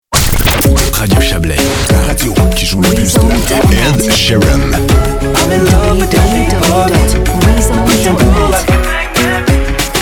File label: Radio Montage Club; Radio Chablais